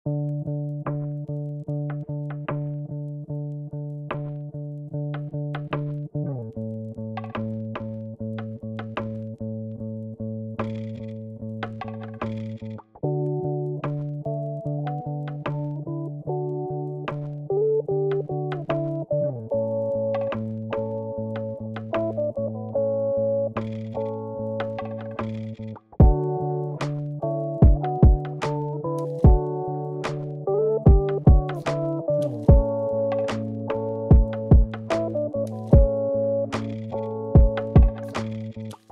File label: lofi